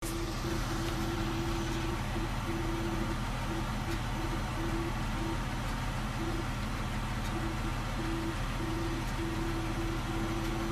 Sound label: help identifying engine sound car noise; ford escape